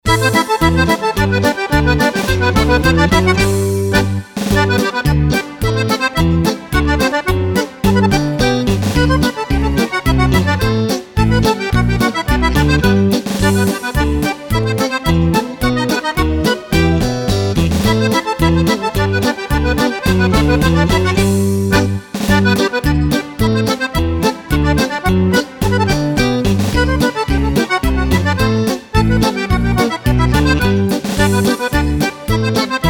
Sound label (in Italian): Accordeon